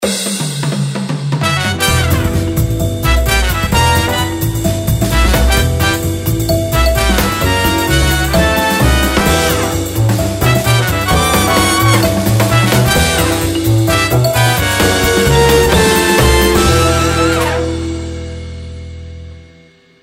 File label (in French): GENERIQUE